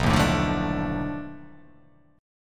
B 7th Sharp 9th Flat 5th B down

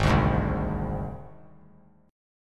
G sharp Major Flat 5th; G#Mb5 strummed; JGuitar

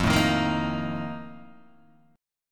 F 11th, Guitar, DADGAD

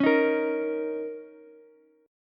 A sharp Minor Double Flat 5th, Ukulele; strummed; x,13,11,13; JGuitar

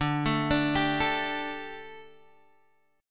D Suspended 4th Guitar Open G

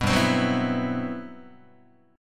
G Major 13th, Guitar; strummed; JGuitar

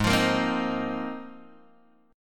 Ab 6th Add 9th Ab, Guitar, Open G

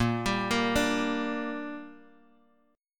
Bb 6th Bb, Guitar Drop D

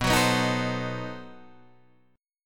B 7th Flat 9th B Guitar Open D

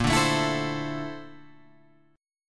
Bb Major Sharp 11th, Guitar, Open G; 8,7,7,9,10,10

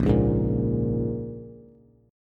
Bb-Minor 7th-Bb-down-Bass; Bbm7 strummed

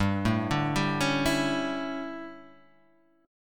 D Major 7th Suspended 2nd Suspended 4th

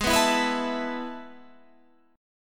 A Major 7th Suspended 2nd

